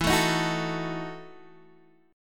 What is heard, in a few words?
E Major 7th Suspended 2nd Suspended 4th E down Guitar Standard; strummed; JGuitar